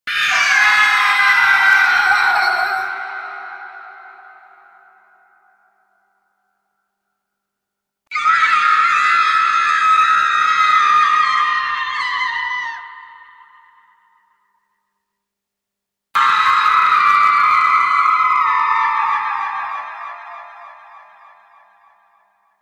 urla spaventose effetti sonori horror scary sounds horror sounds scary scream